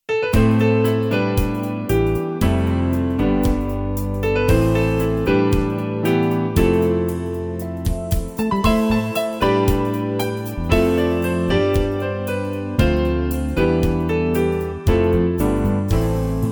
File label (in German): Piano lacheln